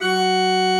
Church Organ F#4; Best Service; Advanced Media Trax Modern Composer

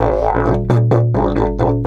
130, Didgeridoo; Big Fish Audio - Didgeridoo and Other Primitive Instruments; 130BPM

